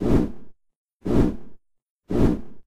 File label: wings flap large